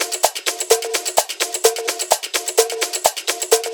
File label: Latin House Loop 128 BPM; Vengeance Samples